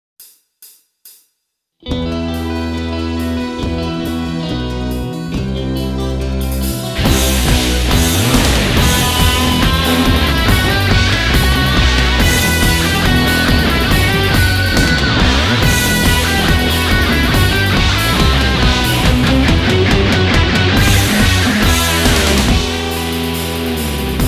Vocal Minus Track

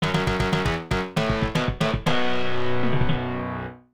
Game Start; captain commando; arcade

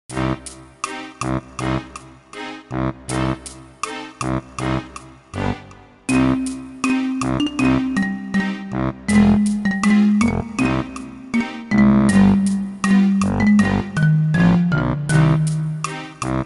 Caves; Banjo-Tooie; Spiral Mountain

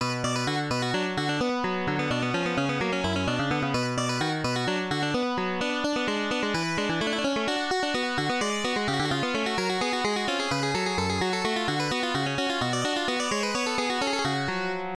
Piano; Doukyusei; X68000

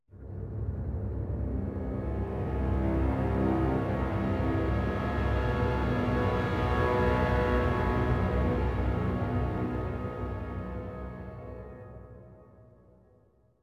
Riser; N.O.V.A. 3 Near Orbit Vanguard Alliance

